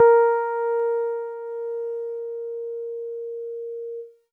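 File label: E Acoustic DX100 A#0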